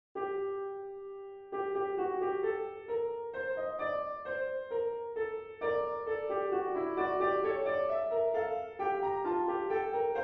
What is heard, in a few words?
Fugue (piano)